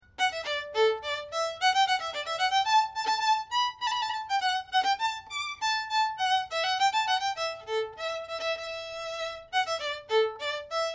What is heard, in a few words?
rondeau fast, violin; mouret